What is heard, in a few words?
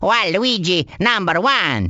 happy; tf; waluigi